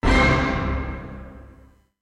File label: orch hit